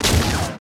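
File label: laser gun; Total Control - Action Cutz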